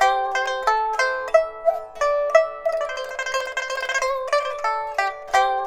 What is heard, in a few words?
PIPA, 90; INIS - Opium